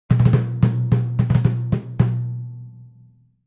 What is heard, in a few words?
Timpani Hands, mallets timpani